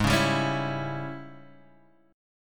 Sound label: G sharp Major 7th Suspended 4th Sharp 5th, Guitar Open D; 6,4,5,7,7,x